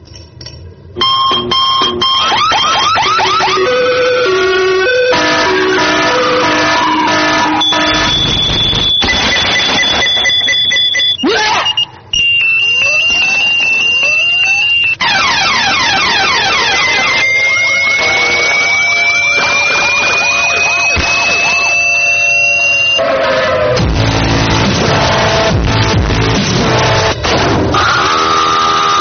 alarme altao

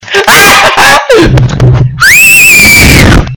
assobio

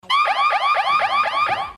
sirene; passa ou repassa

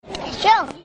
cachorro

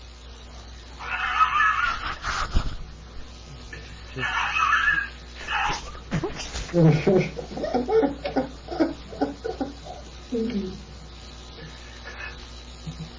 risada

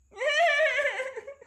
risada de ladrao

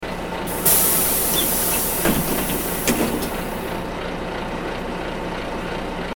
barulho de porta do autocarro a abrir

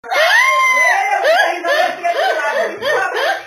melhor risada

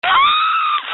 gritinho

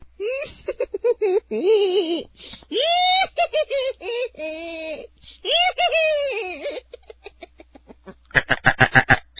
risada epica

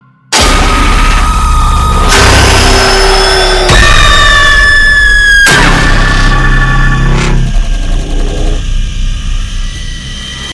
grito susto

